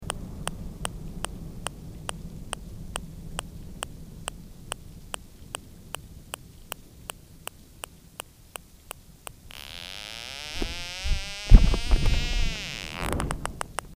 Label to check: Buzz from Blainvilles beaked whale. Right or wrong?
Right